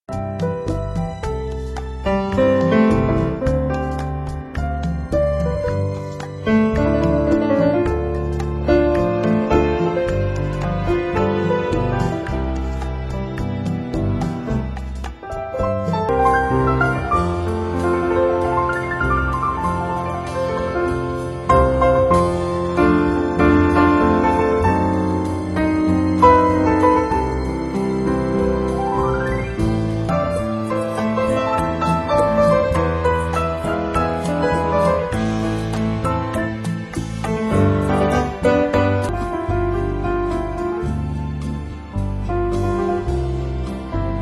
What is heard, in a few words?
piano